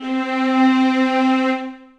Strings Ensemble, Orchestral Strings; Ableton

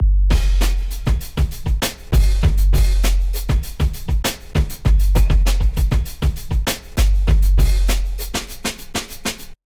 Drums, breakbeats; Ableton; Breakbeats by KutMasta Kurt

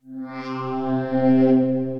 Chorus Pad, Ethereal Pads; Instruments; Ableton; Mood Reel

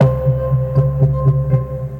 Metal Can Float, Tense Pulses

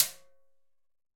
Timb H Cascara, Timbales; Latin Percussion; Drums; Drum Hits; Ableton; Factory Packs

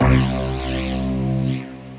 Deviant Synth; Ableton; Retro Synths; P-50 Linear; P-50 Structures

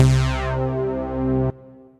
SynBrass; Synth Brass; Kershaw SynBrass; Ableton; Factory Packs; Singularities

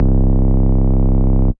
Moog, Analog Bass; Moog D; Ableton; Factory Packs; Retro Synths; Analog Bass Vol 1

